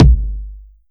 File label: rich mids bass drum sample g key